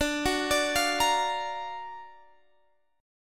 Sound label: F sharp Augmented Major 7th, D